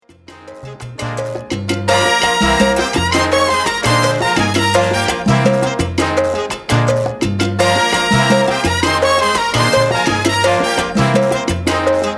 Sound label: salsa